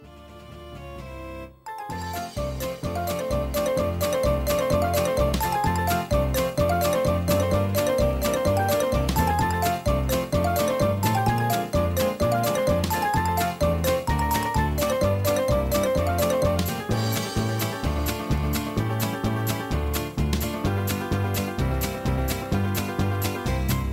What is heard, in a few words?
Patter